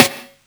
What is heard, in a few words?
SD, korg drums; Korg Electribe; ESX-1; electribrary-3